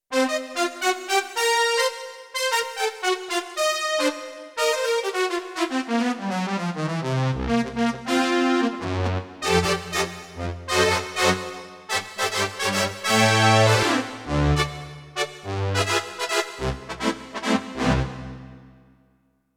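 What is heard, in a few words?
Ensemble Horn, Roland D-70; Factory Performances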